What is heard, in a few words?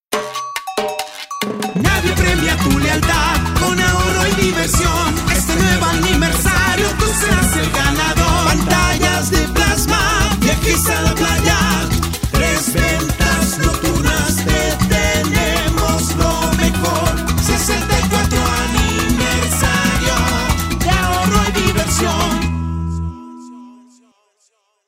Jingle; Merza 64